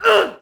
Boxing Female Punch Grunt

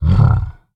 MONSTER Growl Deep Short; MONSTERS & CREATURES; mono; Universal Sound FX

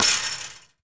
Street Ball Metal Basket; SFX Kit

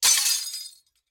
glass break; Blind Drive

